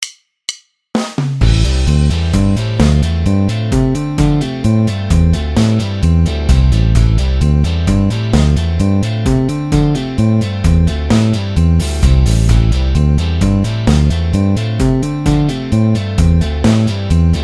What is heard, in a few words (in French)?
hammers